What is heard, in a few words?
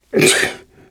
gurgle; Garry's Mod; outland; eli